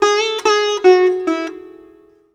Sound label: SITAR LINE; SITAR RIFFS2